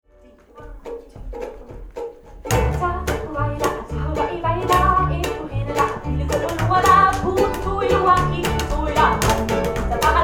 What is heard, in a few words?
Hawaiian war chant, ukulele